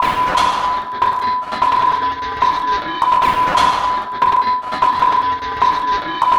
Loop, Esoterik Beatz; Sonic Foundry (Sony Creative Software); Agrymet

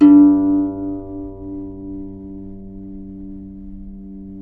CEL HARP G1; USB Soundscan vol.23; Historical Instruments; AKAI

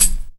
Tamb, Percussion Hits; Zero-G Jungle Warfare 1, 2 & 3; Drum Loop Hits; Cha Hits

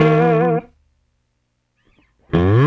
Bass

